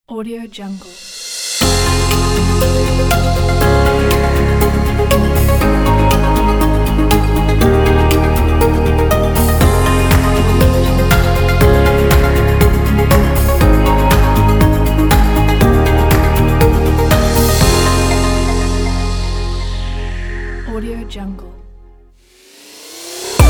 logo tech pack

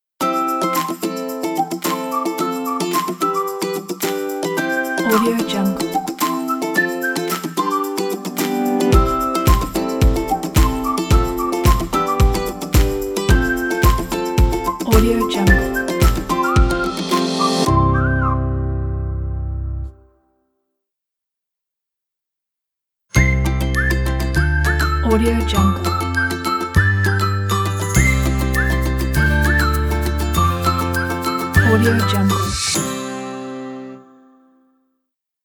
kids game logo pack

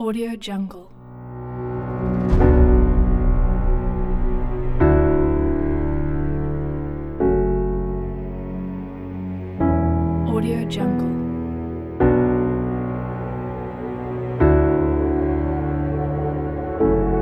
inspiring beautiful cinematic; beatroofstudio

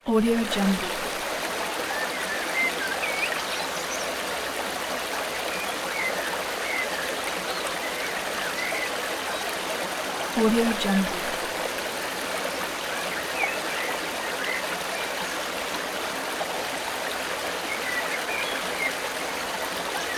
river forest

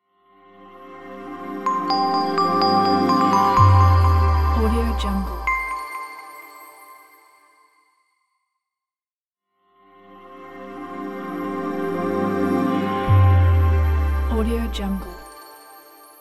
ambient logo reveal

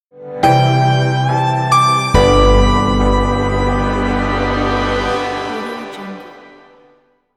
gentle calm logo; yofikmusic

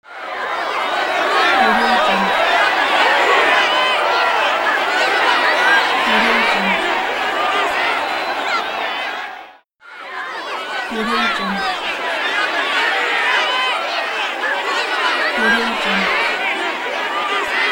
excited crowds ambience

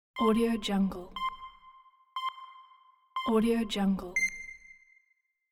game start countdown

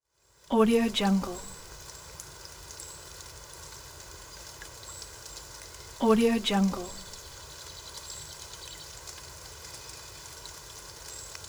gentle forest rain

